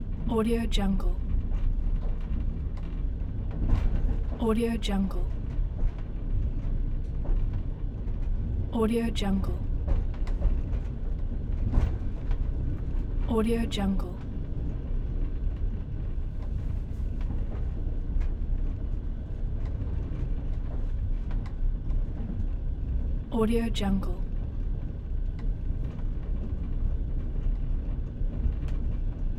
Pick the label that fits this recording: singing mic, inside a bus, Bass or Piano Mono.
inside a bus